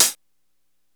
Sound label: 909 closed; E-MU Formula 4000 Series Vol. 2; OrbitPercMaster